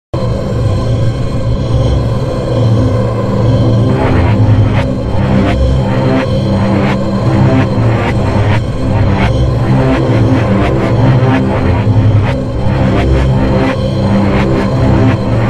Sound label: Straight Rev All Lows